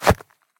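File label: sharp fist punch into target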